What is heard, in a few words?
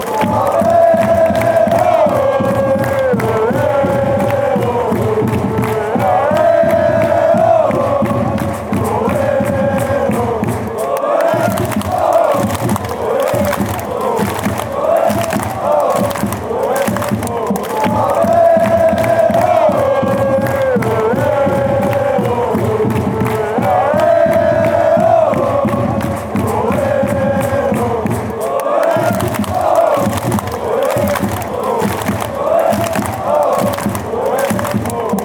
away awoo, fanchants